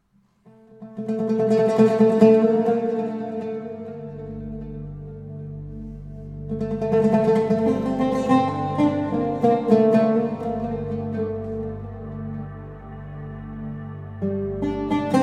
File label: Emptyness synth